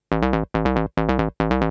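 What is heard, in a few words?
SYNTH BASS DANCE 140 A; eJay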